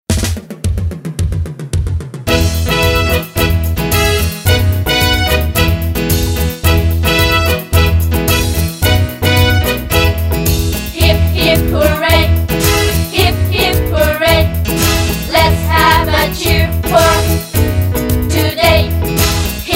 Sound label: hip hip hooray